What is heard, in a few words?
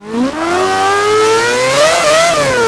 first, vehicles; gmod; tdmcars; furai